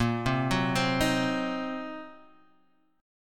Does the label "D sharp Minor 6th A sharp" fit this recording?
Yes